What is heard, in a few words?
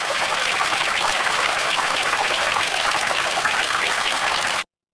water stream